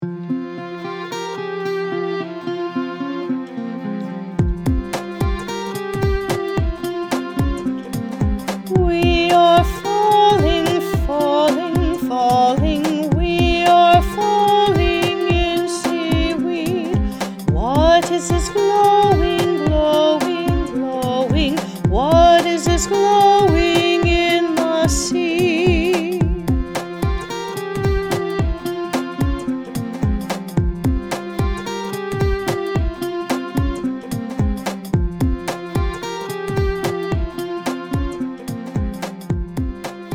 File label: vocals